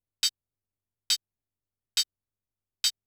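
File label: small metal, techno 138 bpm; Best Service ProSamples vol.54; AKAI; FALLING HI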